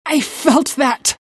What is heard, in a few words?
pain death; queenofpain